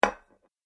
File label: Medium Side Plate Placed On Table With Subtle Wobble; Household; Dishware; Andrew Fleming